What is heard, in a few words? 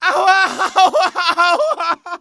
hit, pain; dialogue; mohaa